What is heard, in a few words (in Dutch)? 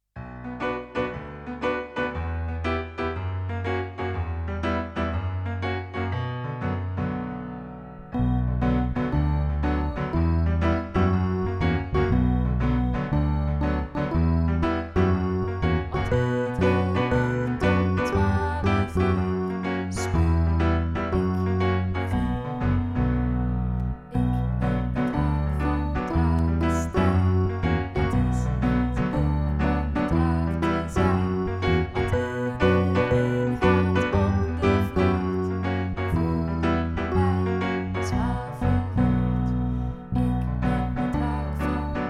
zonder zang